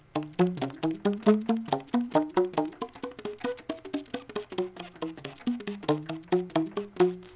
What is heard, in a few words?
slaptongue, sax